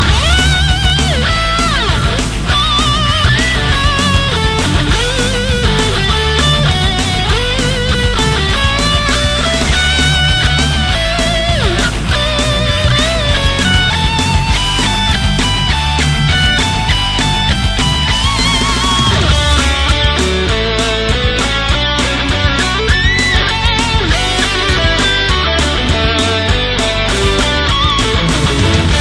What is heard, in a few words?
Rock Canon Guitar